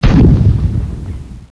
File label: ANTI-AIRCRAFT 8Bit; abbottabad compound